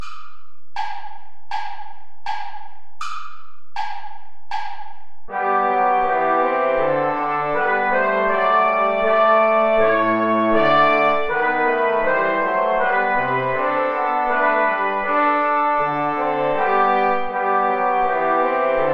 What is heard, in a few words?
play along without trumpet